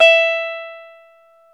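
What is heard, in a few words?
guitar electric 12 string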